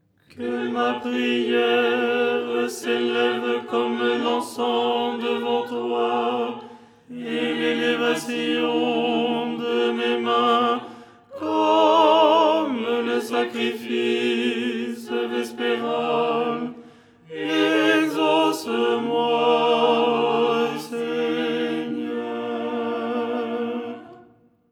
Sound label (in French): tenor